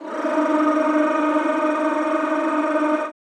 CHO Rrrr

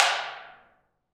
Af. Hand Drm, Ambient

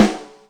Fatbrim, Yamaha DTXpress, Acoustic Snare

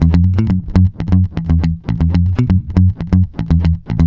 F#, groover, bass; Spectrasonics; Bass Legends

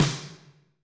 Snr Wood Room, Alesis D4

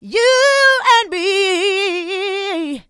Female Vocals; Houseworx

